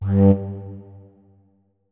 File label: flute notes; Hideout Furniture